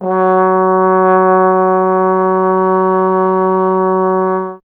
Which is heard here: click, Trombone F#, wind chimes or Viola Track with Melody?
Trombone F#